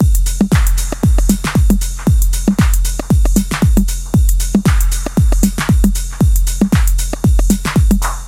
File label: dub techno loops loop